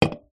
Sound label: food drink cola can full open set down table; zapsplat